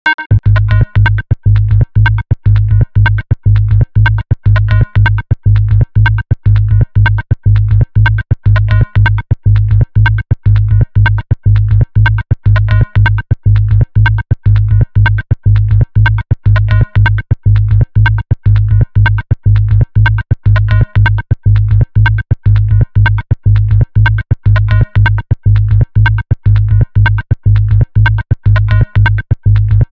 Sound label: game level music; mixkit